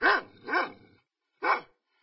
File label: dog; Source Engine; cstrike